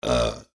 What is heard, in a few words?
belching